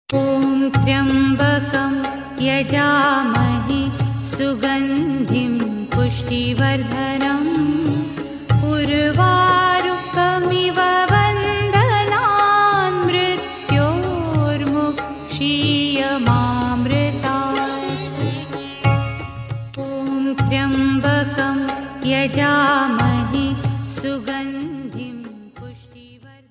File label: Om Tryambakam Female Ringtone; ringtones